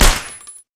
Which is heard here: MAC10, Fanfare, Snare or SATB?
MAC10